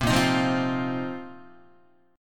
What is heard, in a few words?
A sharp Suspended 2nd Suspended 4th A sharp, Guitar; strummed; A#sus2sus4; JGuitar